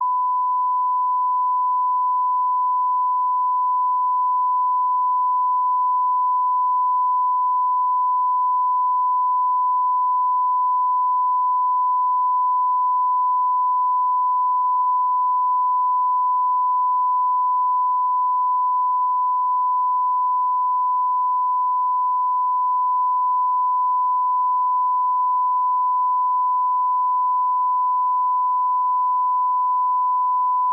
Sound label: Calibration 68dBA; Nevaton